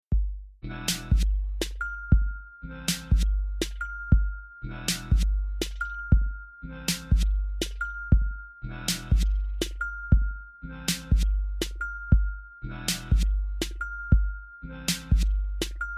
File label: Am 120 Groove